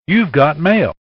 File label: mail